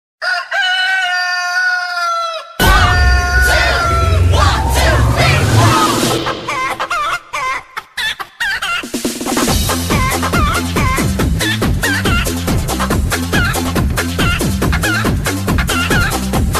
CHIKEN RAP

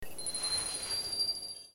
orb dropdown; SAO

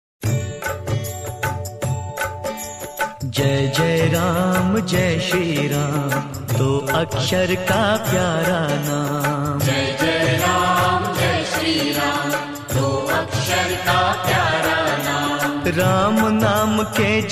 slow ring; ringtones

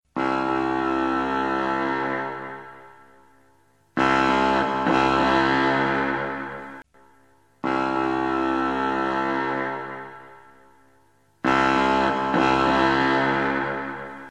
Foghorn

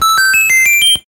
1 Up; SMB3

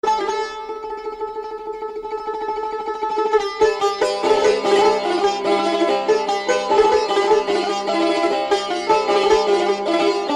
iranian music; jazz

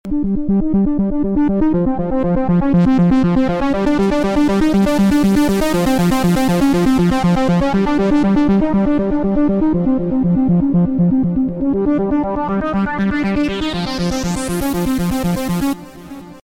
trance

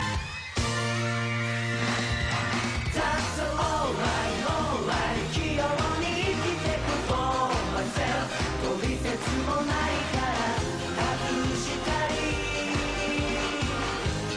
guitar magic

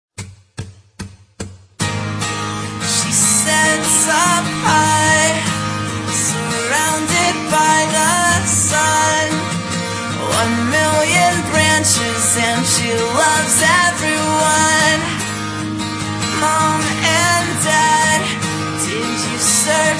acoustic

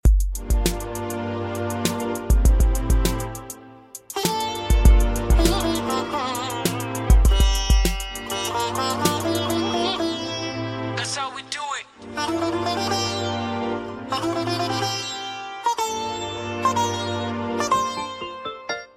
music

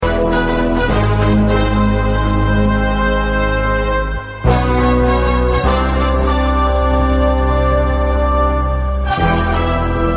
businessman guitar

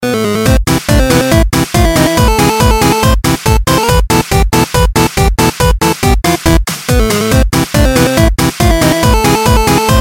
Classical Techno

